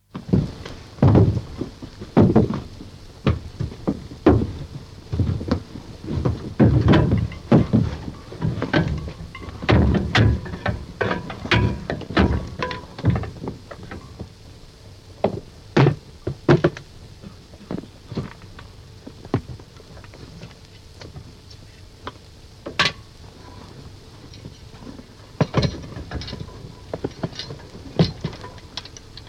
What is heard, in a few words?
Deep Sea Diving, diver enters water down ladder, with bubbling; from 78 disc; BBC